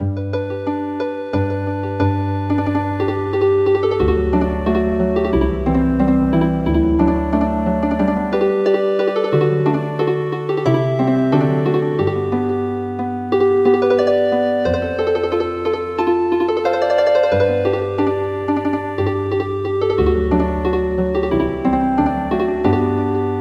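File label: Healing Harp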